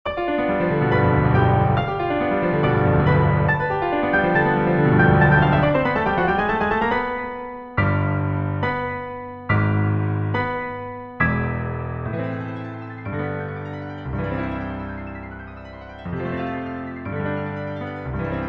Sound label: Cadenza